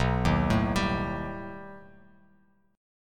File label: B Augmented 7th B down Bass Standard; arpeggiated; JGuitar